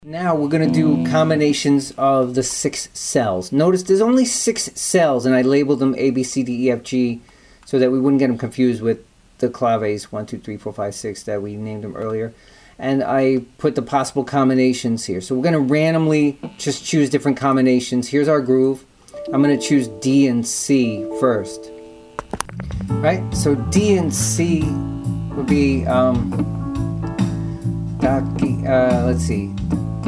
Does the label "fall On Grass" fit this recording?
No